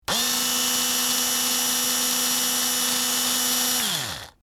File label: Electric screwdriver long rev